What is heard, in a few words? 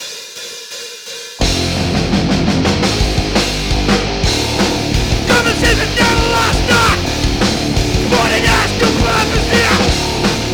riot drums vox